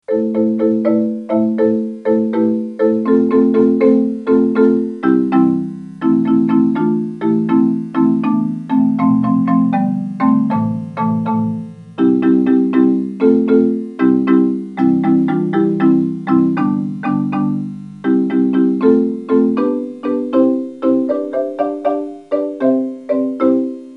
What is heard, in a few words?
marimba